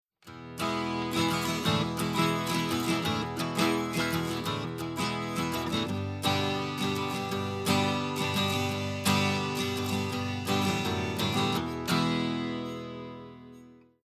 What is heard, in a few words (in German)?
Guit, Preamp, Output voll, Input gering